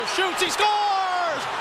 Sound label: goal achieve